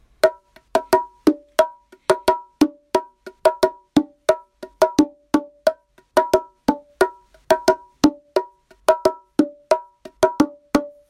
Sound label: Bongo